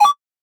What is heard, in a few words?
Open Menu